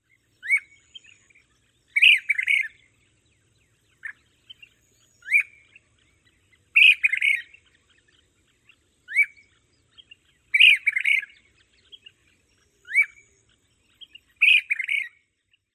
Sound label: dawn song